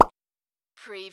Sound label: CARTOON POP